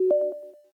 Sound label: Lock